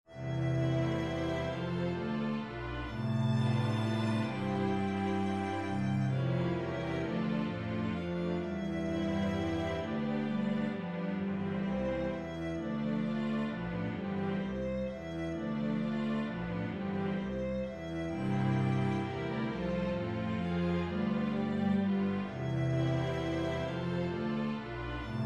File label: concerto eminor, string bass